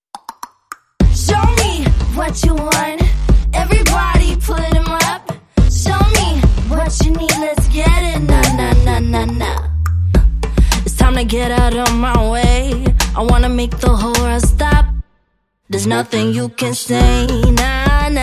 No Brass No Mallets

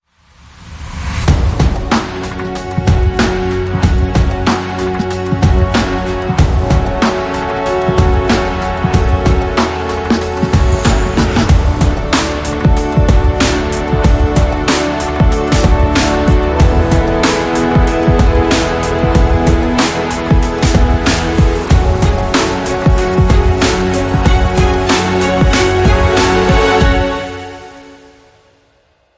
Hybrid Sting

